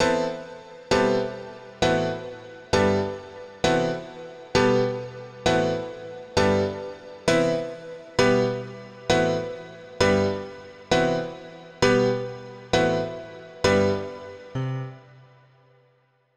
Dance Piano

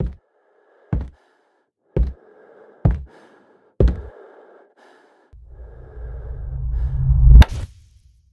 Footsteps Lightswitch

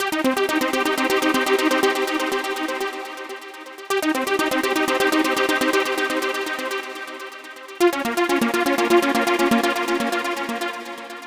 arcade pad; lucah pad b

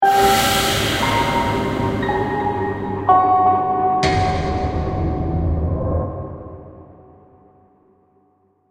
Game Over Jingle

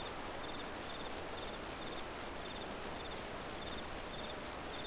Crickets Loop; the princess and the frog; Orleans; Draw Wallpaint

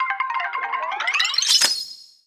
SFX - Gear Cleaned

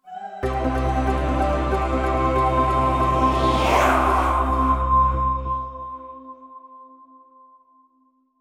Player Victory End

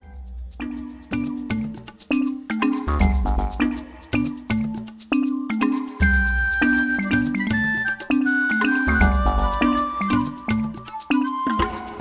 Jungle; lego my world first steps

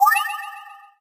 Pickup-Ecto

